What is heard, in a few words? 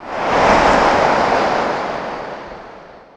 windgust, ambient; Garry's Mod; tf2